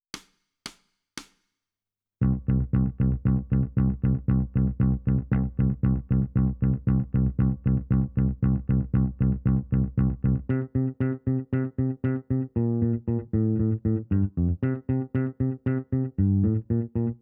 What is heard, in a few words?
Bass